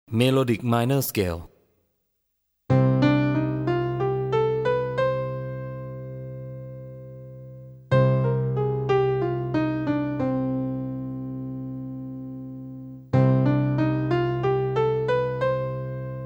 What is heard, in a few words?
melodic minor